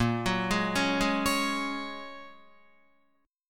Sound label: Bb Minor 7th Sharp 5th, Guitar